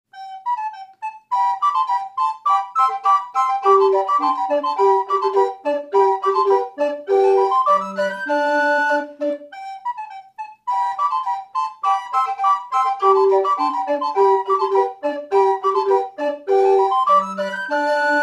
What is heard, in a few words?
Allegro